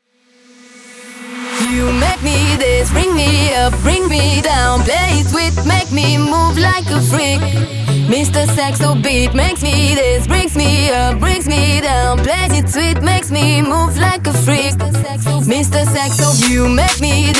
intro outro 130 bpm

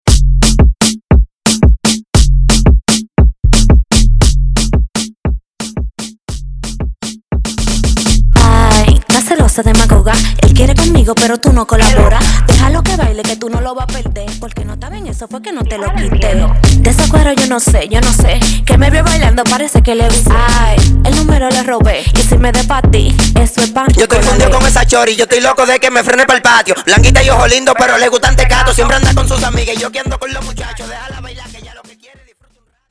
Intro Verso 116 BPM

